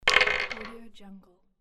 rolling dice

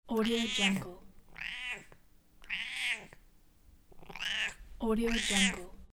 cat meow

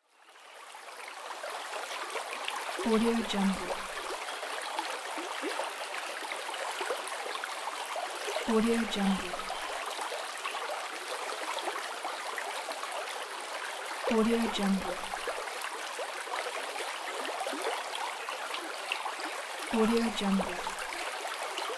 river; pure-noise

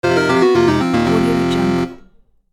retro video game victory; alurcz

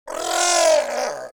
creature fishman shout pain

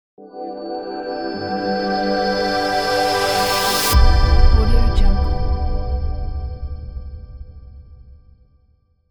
logo reveal

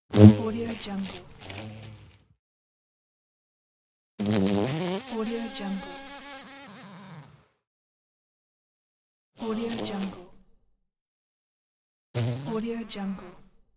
flying bug buzzing

